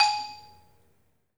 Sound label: 3D MARIMBA; 3D Sound; 3D Keys VOL-1